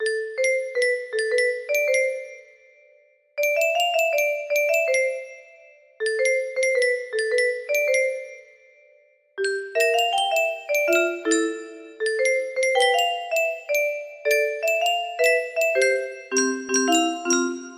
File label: music box melody